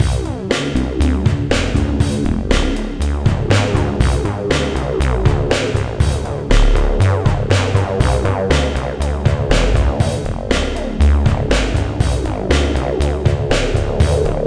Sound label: Failed; Killer Tank; Windows